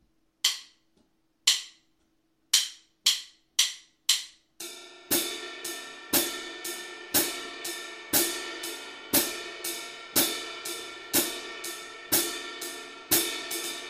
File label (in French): Laché swing main droite